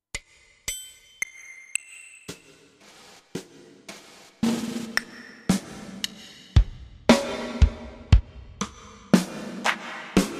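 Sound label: Standard Kit, drum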